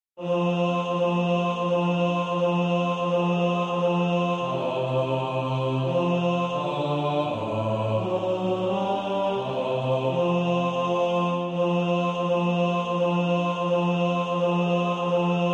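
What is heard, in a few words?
bass, practice music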